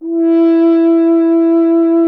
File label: F. Horn; Miroslav Vitous; Solo Instruments VOL-2